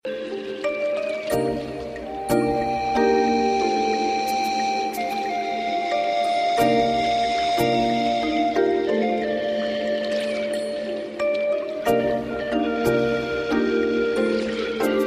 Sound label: refreshing music tone ringtone